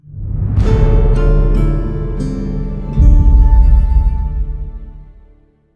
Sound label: Death Stinger; cloud chasers; android